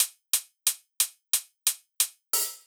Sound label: Hi Hat; Hip-Hop